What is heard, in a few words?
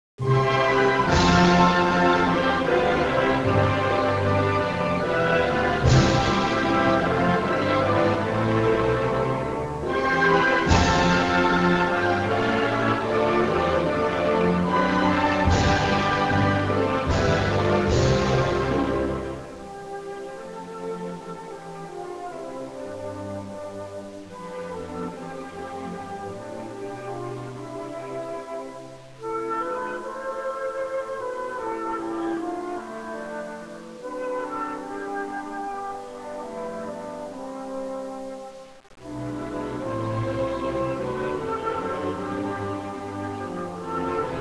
Gambia national anthem